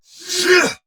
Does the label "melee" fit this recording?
No